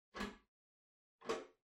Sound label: Pendant Light Far Pull